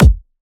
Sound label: Kick, Dubstep; Dark Matter